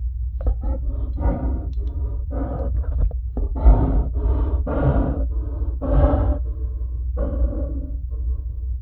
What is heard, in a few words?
trex breath